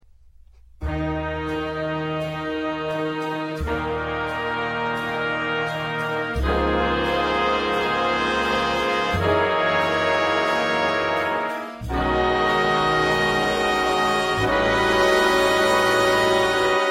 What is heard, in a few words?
chorale